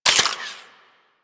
Modular Secondary Handle Fast Reload, Guns; SU Modular Guns; Reloads

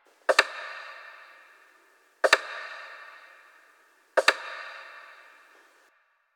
Cave Bedroom Hospital Device Loop; Ambience; Warframe; Stalker Cave

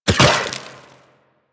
Shot, Weapons; Warframe; Moa Pet; Cryotra

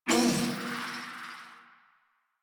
Pain, Barks, Battle; Warframe; Zealoid Prelate

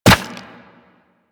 Run Bone, Footsteps; Warframe; Operator

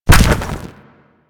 Colossus Hand A; Warframe; Colossus Boss

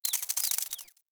wetland forest bird scatter; Ambience; Day

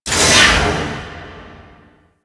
Moon Security Sentinel Start; Warframe; Ambience; Orokin Moon

